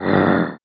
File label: grrrrr; talking ben